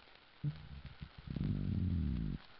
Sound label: Nassau Grouper Estriatus